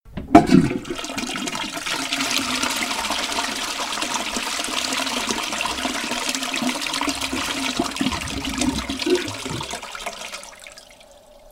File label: toilet flushing